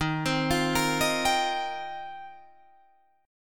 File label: Eb 6th Add 9th, Guitar, Drop D; 13,15,15,15,16,15